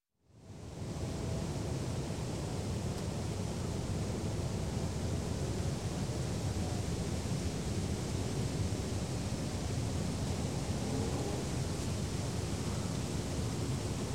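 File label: Wind through Trees, birds In Background, Weather; Sound Ideas 2000 Series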